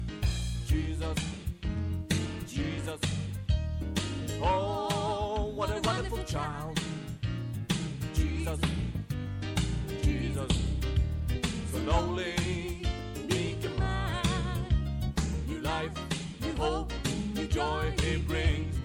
Jesus what a wonderful child, Bas

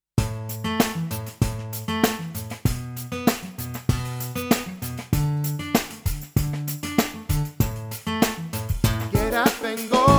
Bas

